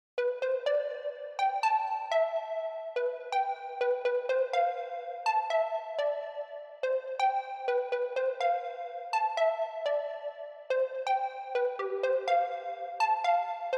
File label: melody